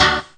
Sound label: Synths D; Vengeance Samples